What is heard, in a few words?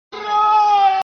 raaaaaah